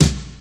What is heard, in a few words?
warm snare b key; 80s